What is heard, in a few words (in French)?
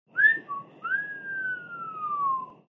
sifflet admiratif